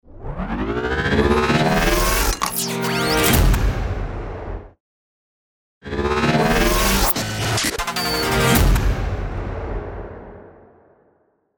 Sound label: techno logo